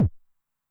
BD High Tune Short Decay, 909mod, BASS DRUM; classic machines

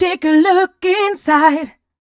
f mel e flat, fem Vocals

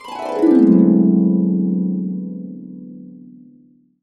D, Harps; USB Soundscan vol.62 - Complete Harps; AKAI

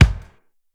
SPEED KICK, drums; Basement drums vol 2

